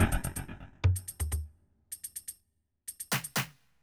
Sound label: afrobeat 125